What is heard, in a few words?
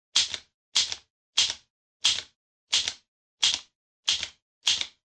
Typewriter